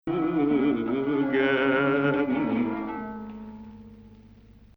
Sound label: Hijaz; Hijaz 1